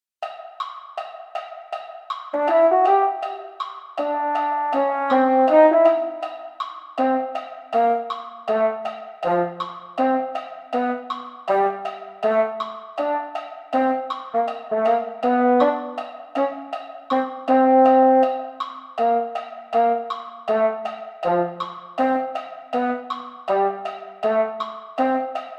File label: mellophone; holiday brass